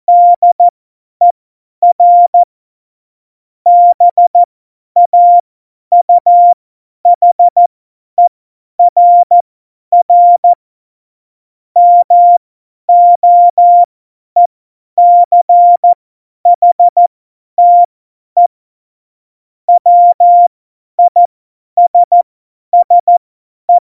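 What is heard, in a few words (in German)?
Witz, cw morsen; Morsekurs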